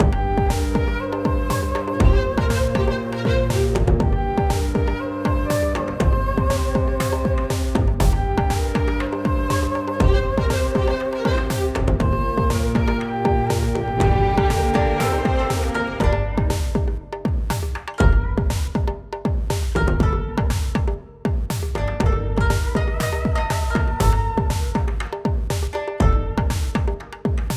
Background Music; Itadaki Smash

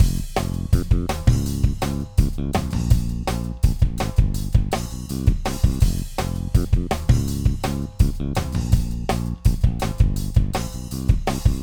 Drums + Bass; Gross - Section A

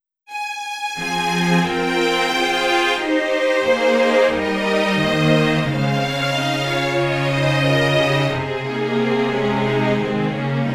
Eternity (Strings Version); shoumetsu toshi original soundtrack

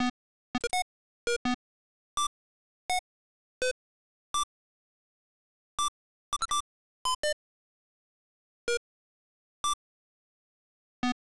Explosion (Distorted); Mario Paint

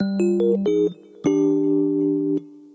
Jingle Sad Short; Pool Slide Story

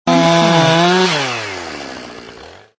Saw Sound

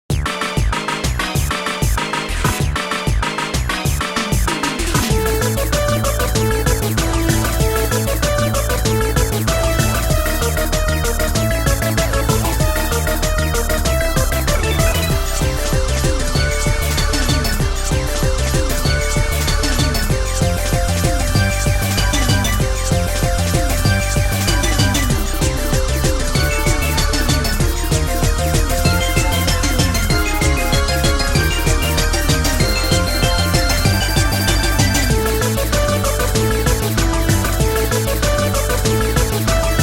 Battle Arena

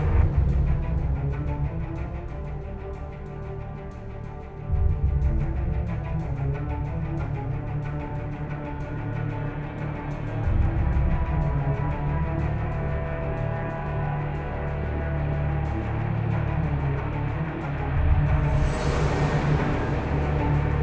Dialogue Tension; The Dark Knight Rises; Android, iOS

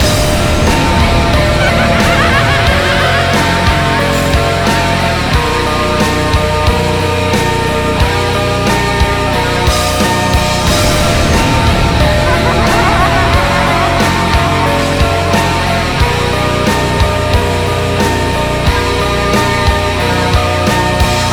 Game Over (No Fade Out); Verrückt

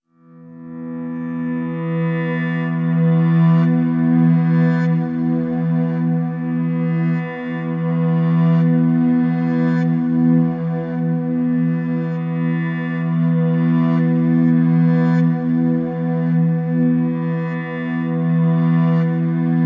Gridnode Overlay Drone

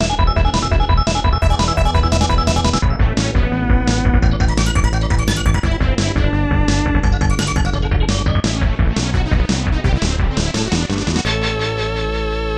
Final Fanfare; Star Fox EX

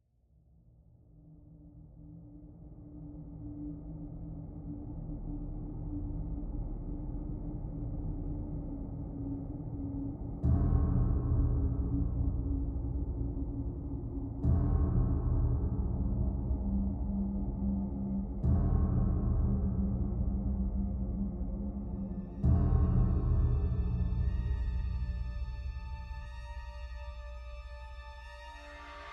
Ambient Idea Nonloop

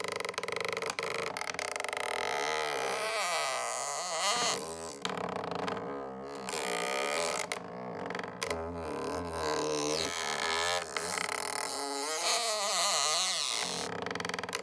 wood squeak loop; teardown; ps5 windows xbox series xs

